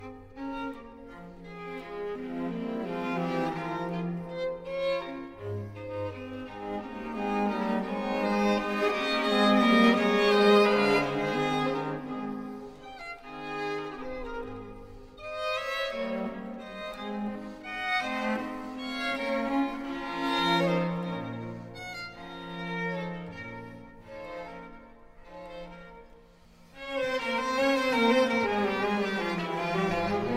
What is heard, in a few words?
piano sonata; beethoven